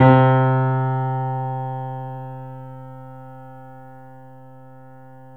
C, Piano; InVision Interactive; The Piano

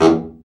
BRA BARI, Bariton Sax

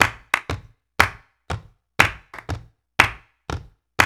World Dance; OZ!

